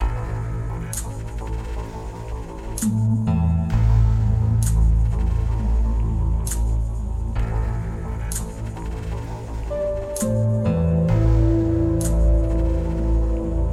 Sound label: ambient soundscape